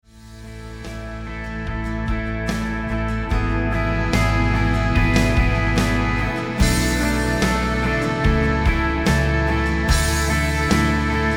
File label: rhythm